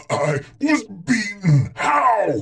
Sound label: death; cooler